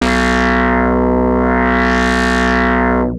Anasound Long lo B, Industrial Analog Single Notes; Sonic Foundry (Sony Creative Software)